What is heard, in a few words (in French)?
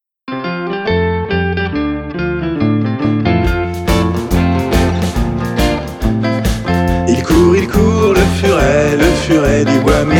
comptine rock; Auzou; Furet